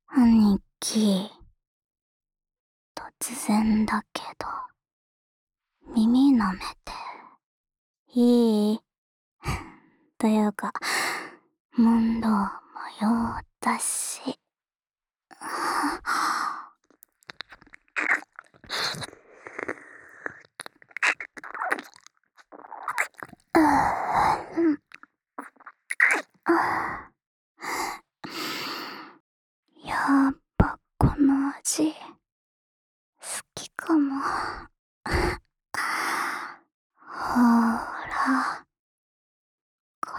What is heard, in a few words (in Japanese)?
浅い耳舐め